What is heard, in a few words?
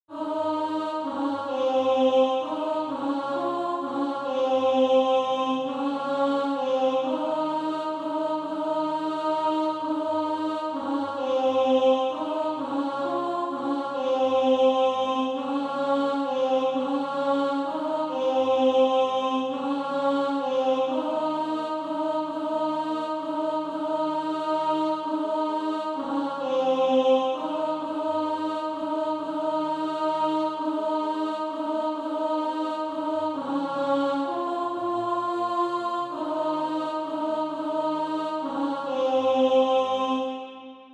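alto, Practise Music; SATB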